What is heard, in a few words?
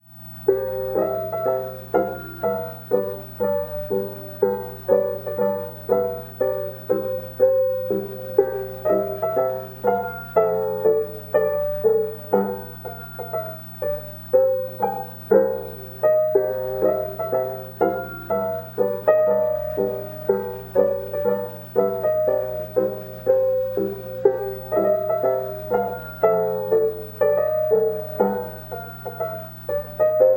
TV Little Duck Piano; The Park